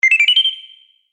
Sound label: Science fiction Activate Sound